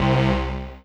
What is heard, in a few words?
Synth Hit C